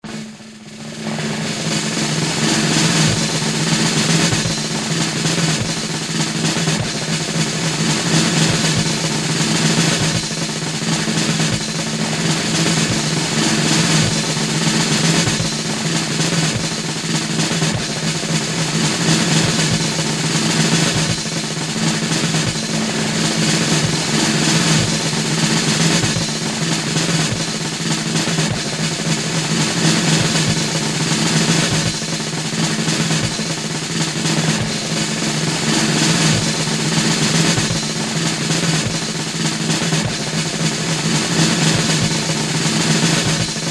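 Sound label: fast drum roll sound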